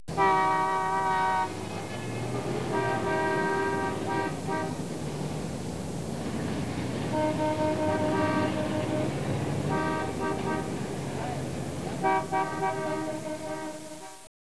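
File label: TRAFFIC, car sounds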